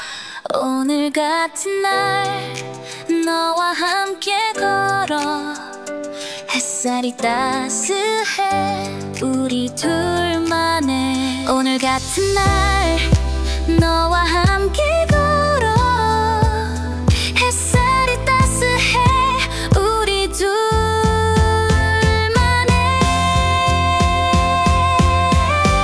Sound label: easy pop F; acestep